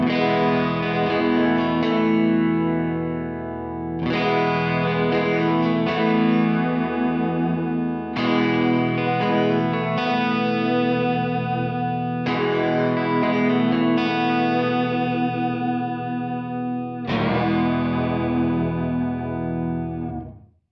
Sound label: Reverb Reflections